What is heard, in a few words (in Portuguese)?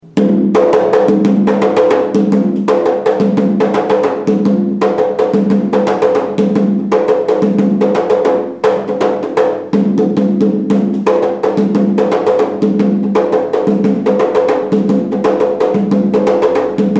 congo, atabaque